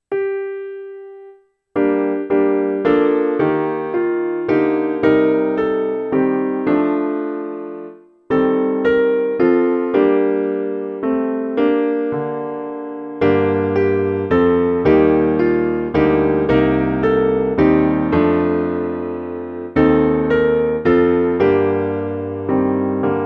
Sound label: Alleluia piano